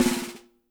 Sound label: SNARES; Spectrasonics; Burning Grooves